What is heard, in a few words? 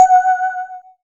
SYN WATER D, Microwave; Sound & Vision - Gigapack I CD 2